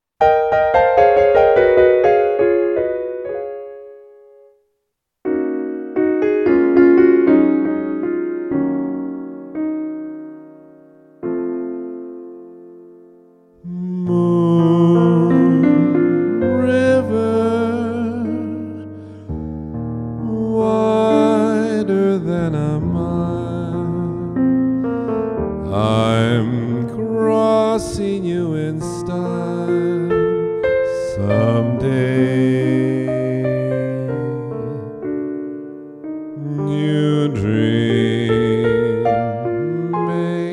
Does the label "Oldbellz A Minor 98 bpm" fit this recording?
No